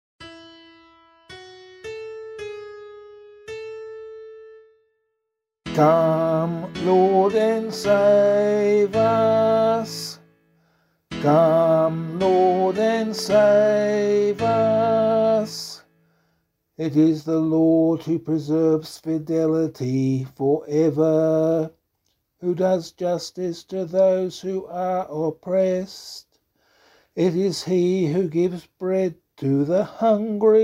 Psalm vocal

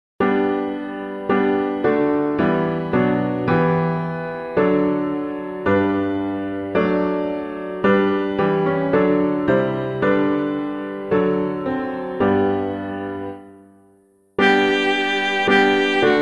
The Saints Who Toiled from Place to Place, piano

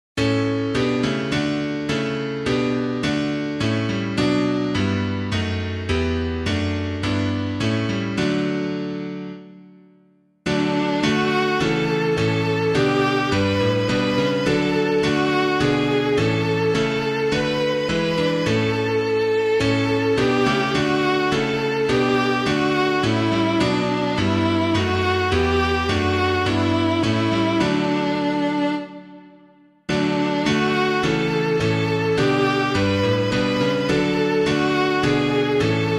piano

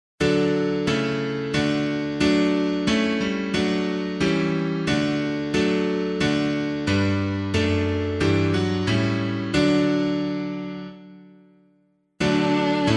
piano

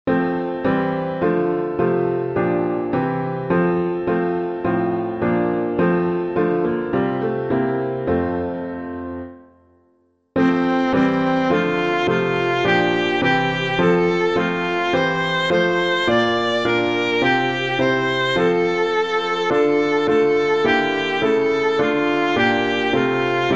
piano

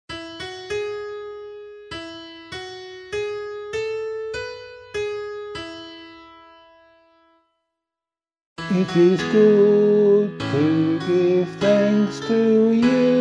Psalm C vocal

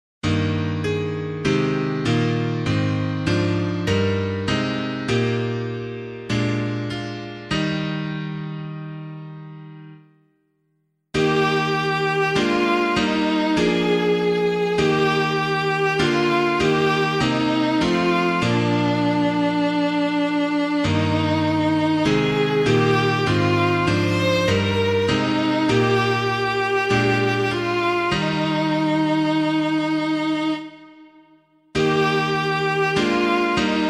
piano

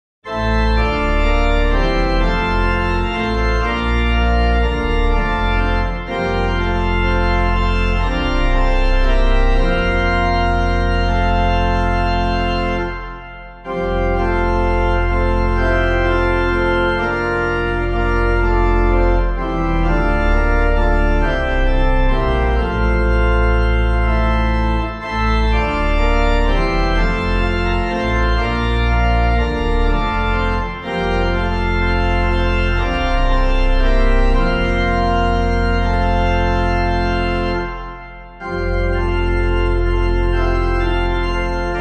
organ, hymn; Come Now and Praise the Humble Saint; Williams; Clyde McLennan

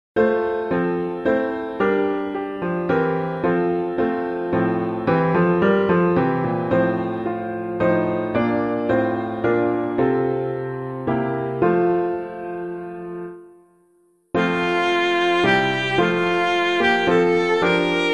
piano

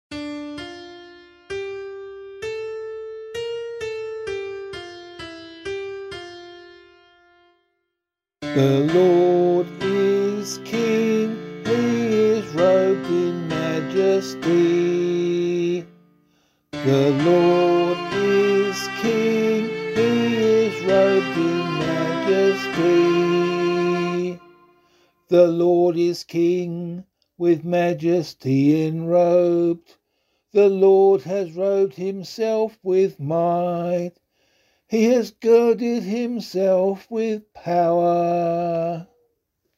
Psalm, vocal

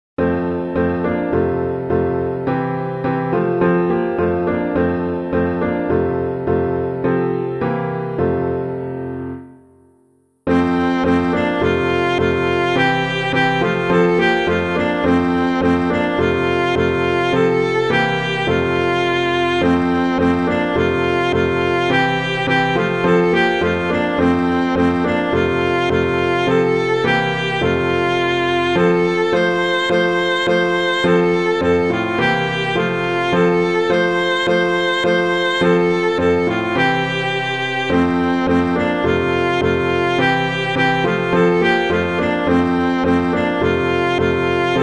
piano

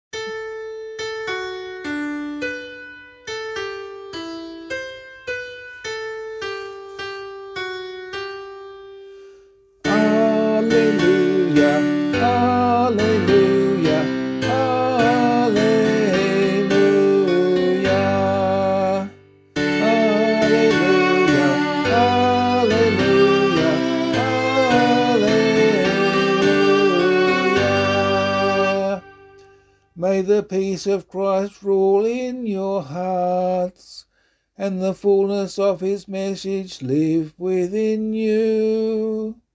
Holy Family Gospel A - vocal, Gospel Acclamation

